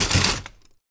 Glass

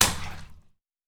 Puddle

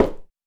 High Heel

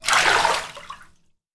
Shallow Water

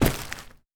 Forest

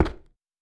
Cement

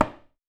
High Heel

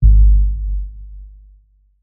Sub Impact

